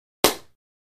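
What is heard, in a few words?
Badminton Battledore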